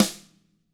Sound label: TAM3 RIM, Drums, TAMA; ILIO; Double Platinum Drums 1; TAMA+TAMA